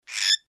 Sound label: cockatiel